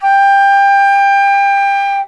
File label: G5, Basic Flute